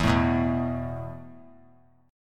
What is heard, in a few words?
E Suspended 4th E, down, 3C,3F,3A,3D; Esus4 strummed